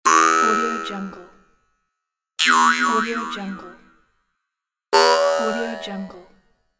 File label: boing; julesounds